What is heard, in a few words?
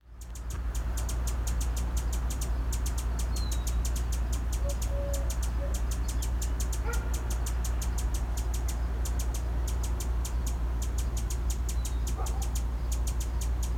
Rufous Hummingbird, Selasphorus rufus